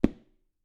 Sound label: Step on wood